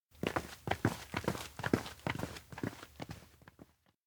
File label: steps outdoor plaza walk; ken; anemoi